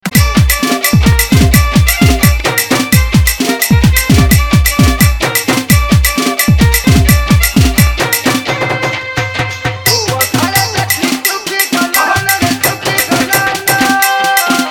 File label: Samalpuri Open Dance Remix; New Year 2018 Celibration